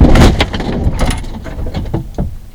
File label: impact car very light; Kart